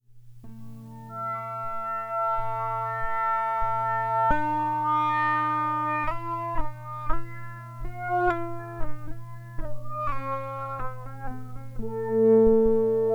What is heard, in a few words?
Pitch Sequencer; Active Control